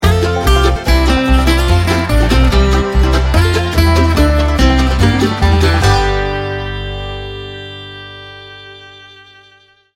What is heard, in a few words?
bluegrass bedlam sting bumper